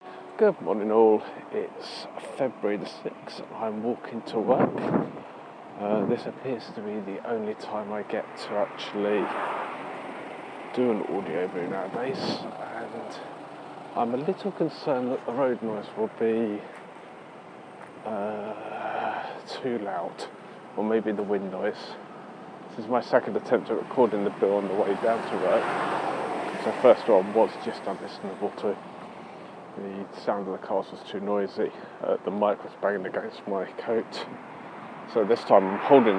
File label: too much background noise